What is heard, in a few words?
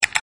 alert